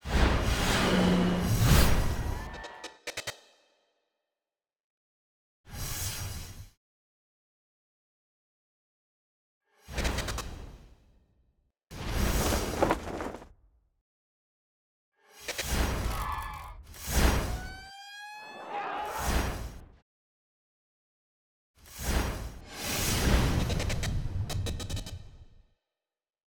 SFX; NewsOneNow

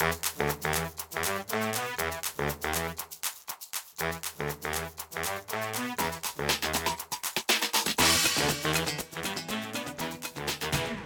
Music; Bad Dad Rehab; Bad Dad Rehab trailer

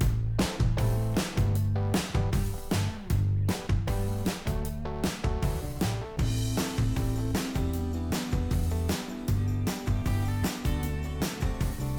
Music; A Different World

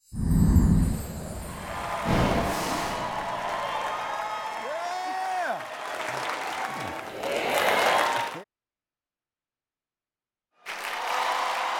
SFX; Trumpet Awards 2013

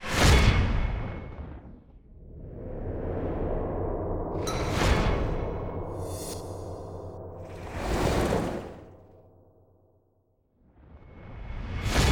SFX; Fatal Attraction Generic

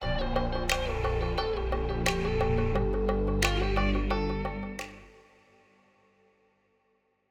Music; Perfect Match Sneak Peek

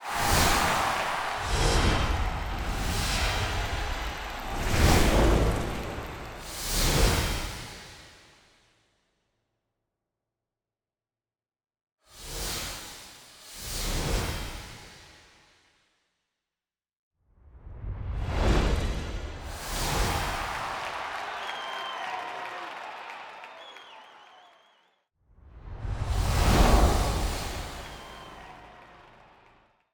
SFX; UNSUNG S7

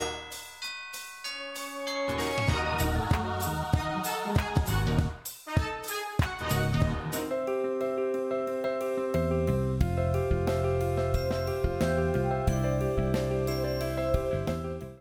Music; A Christmas Blessing launch revised

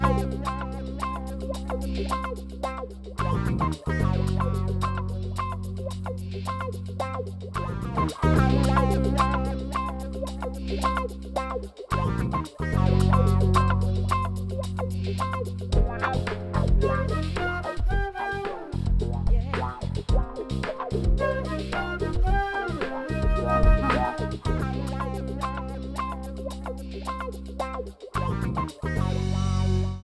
Music

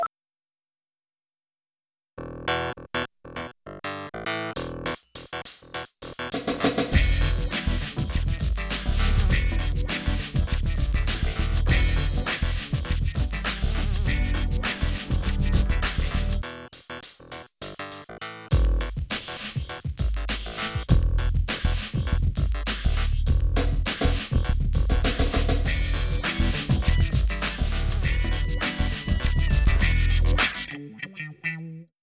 Music; R&B Divas ATL; R&B Divas For EMF

